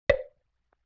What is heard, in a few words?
Temple Block Hit; Vintage Cartoon